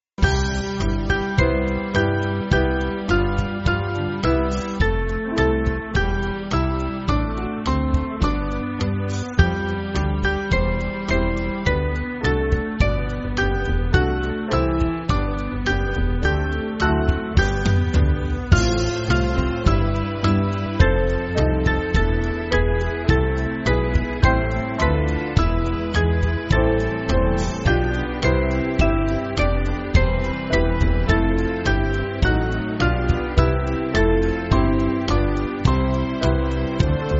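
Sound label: Band, Free Music Backing Files for Churches; Small Band; 4/C-Db; Lux Eoi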